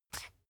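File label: toothpaste open twist, Bathroom; haus-garten; badezimmer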